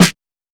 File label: old school wet snare drum f key; snare drum samples